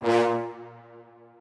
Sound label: strings